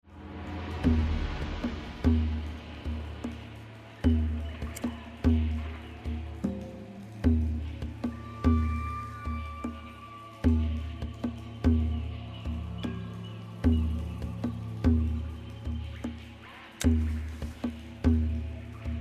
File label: background minigame